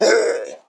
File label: Shield Damage; Pecheneg; Folk Hero